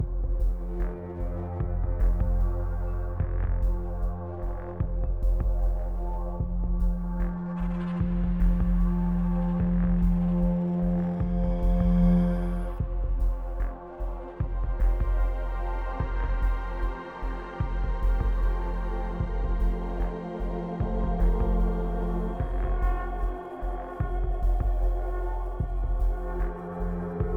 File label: Ambience 75BPM; Rezoning ActAll